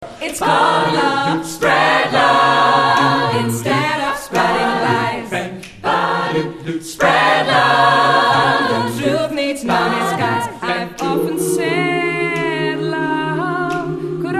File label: ssab